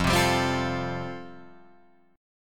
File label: F Major Add 9th, Guitar Drop D